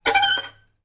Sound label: Violin Screech Noise Short; soundscrate